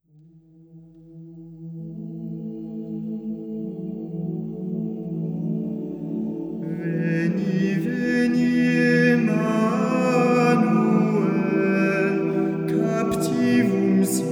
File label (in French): Veni veni Emmanuel choeur d'hommes a cappella